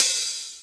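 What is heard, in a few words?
Closed Hi-Hat, Dance Drums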